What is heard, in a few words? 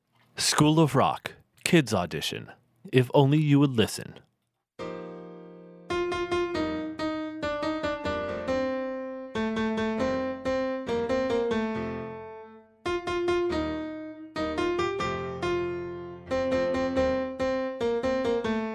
Ab Major MELODY